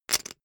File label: poker chip stack rattle short